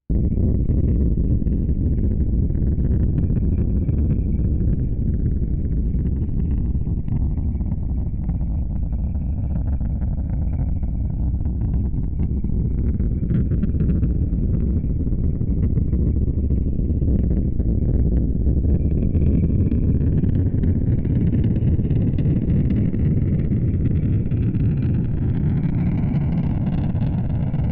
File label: Blasting